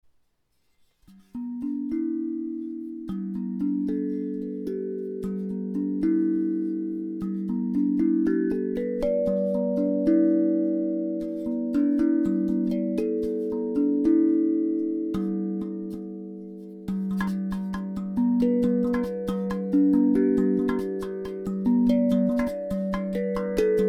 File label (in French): G joyeux 8 notes